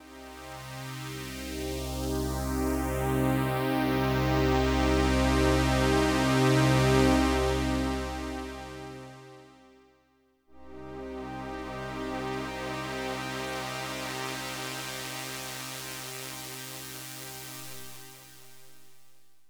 OB-X8 vs A6 Notch

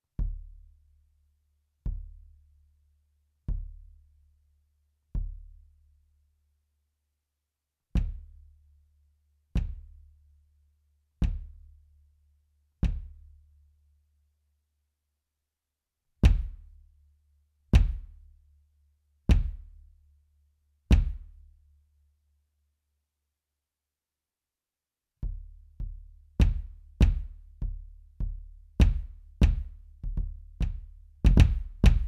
POP

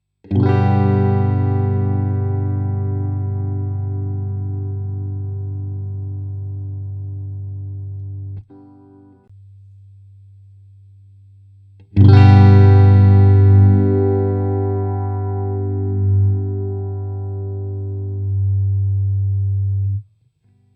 Guitar MID EQ; grace design felix

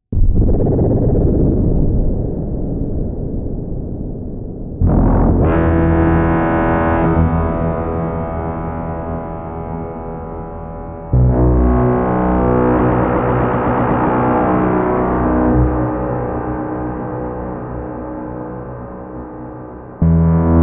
RaumMaschine Synth; Tegeler Audio; Space the Place

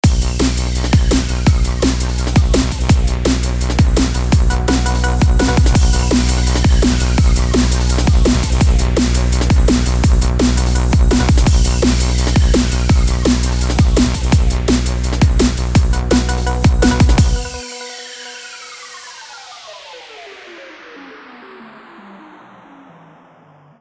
Drum N Trance; Vengeance Sound Avenger 2; Factory 2; SQ

